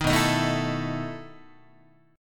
B 11th Guitar; strummed; 6,7,6,7,8,8; JGuitar

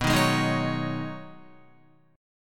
B 6th Add 9th B Guitar, chord; B6add9; strummed

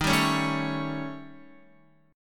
Eb 7th Flat 5th, Eb, Guitar; x,6,7,6,9,9; Eb7b5 strummed; JGuitar

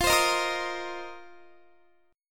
F 7th Suspended 4th, Guitar; strummed

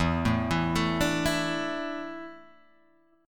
E 7th Suspended 4th, Guitar, Drop D

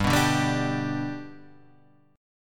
G Major G down, chord; G strummed; JGuitar

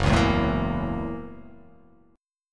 F sharp 7th Sharp 9th

